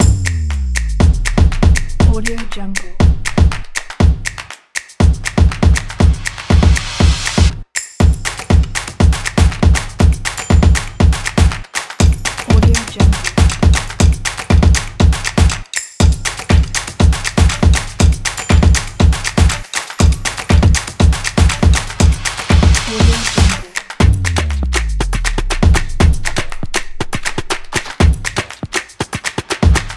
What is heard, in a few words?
for percussion